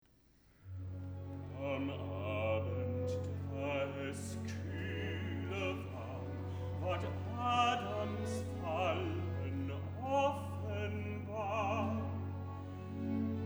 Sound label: recit